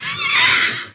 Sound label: mutant